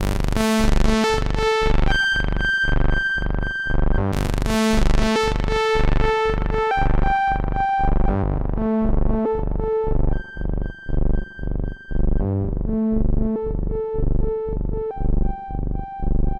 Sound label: DIVA preset LEAD; 3EE Vallet